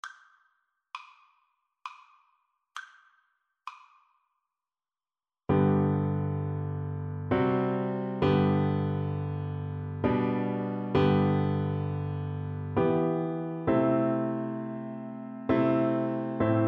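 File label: recorder karaoke; away in a manger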